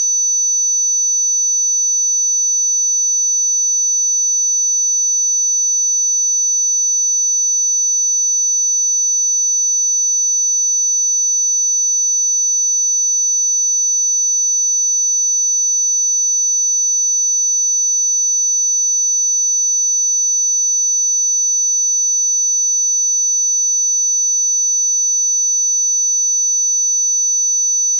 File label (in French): acouphenes